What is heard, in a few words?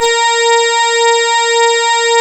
ORGAN, A#; Big Fish Audio; Synclav FM